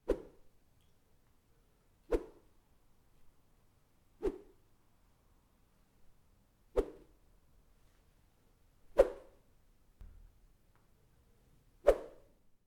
Whip Animation Slide In Medium Pitch

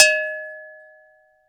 Big cow hit

